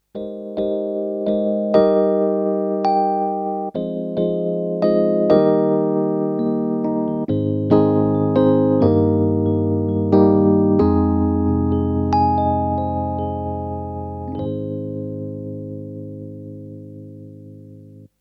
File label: Tremolo EPiano